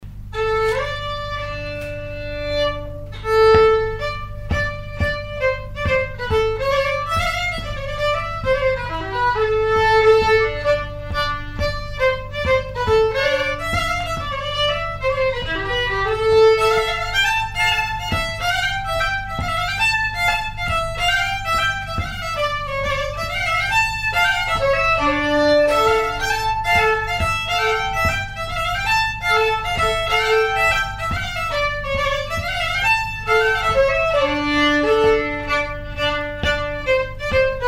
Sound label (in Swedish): Polska